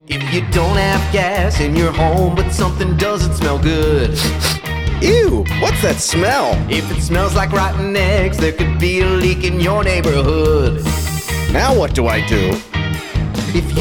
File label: Jingle English